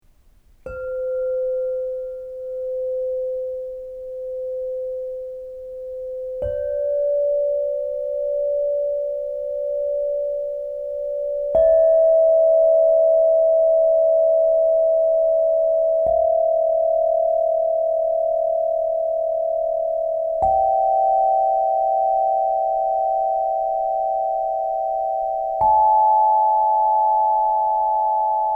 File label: quartzophone